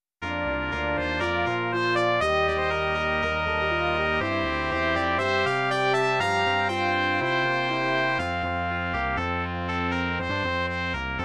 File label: Brass Quintet; A Simple gift